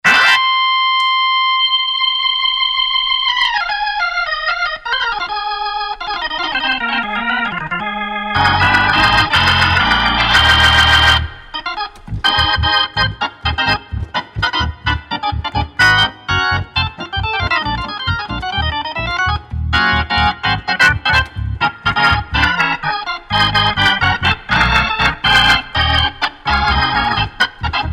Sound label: 3300w Shout, hammond organ